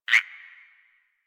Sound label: Forest Frog Close; Warframe; Grineer Forest